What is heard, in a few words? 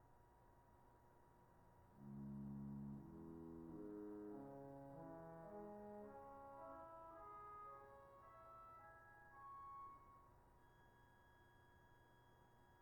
swell salicional, organ; 8’ Salicional; In the 17th century the Salicional was a specialty of Habsburg Europe